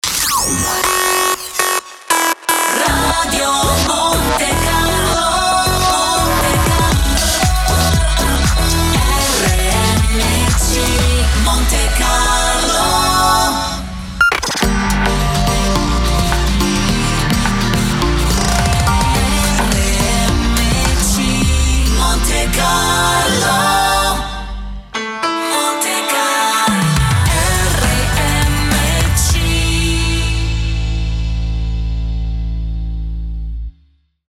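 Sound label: Radio Jingles; RMC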